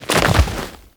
Roll Forward Foley A; Enemies; Warframe; Teshin; DE

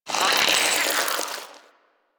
Plant Murmur; Ambience; Props; Warframe; Infested Eris

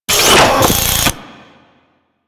Drone Face Shield Close; Warframe; Vay Hek

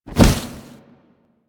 Bird Of Prey Wing Flap Down; Warframe

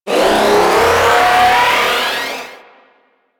Split Laser Open; Warframe; Corpus

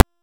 Hunter Bow Ranged Finisher Ground; Weapons; Player; Bows; Envoy Hunter Bow Ranged Melee

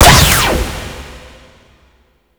Laser Disc Gun Fire; Moa Disc Laser Gun

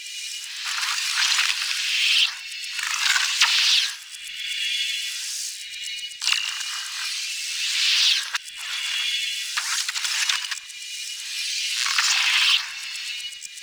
Hologram Loop; Warframe; Protea Quest

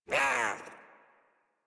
Pet Speak; Warframe; Catbrow; Pet Ship

